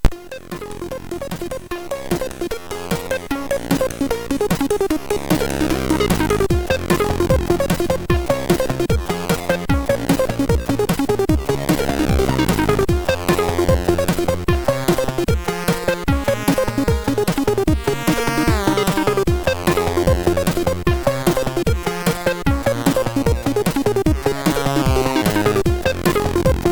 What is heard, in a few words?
Phneumatic, MOS6581R4; hvsc